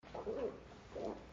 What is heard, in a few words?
Gag; Lewd SFX